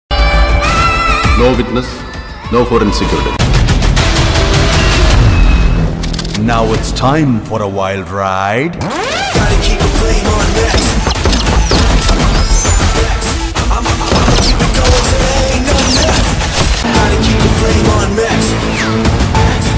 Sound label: agent movie bgm ringtone; akhil